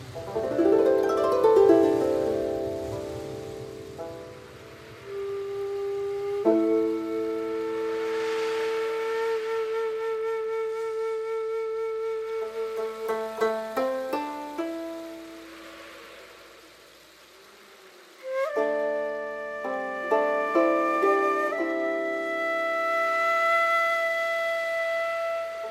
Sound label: slow flute japanese